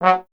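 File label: Brass Trombone F#0; Miroslav Vitous - Solo Instruments VOL-1; 90 s Sample CDs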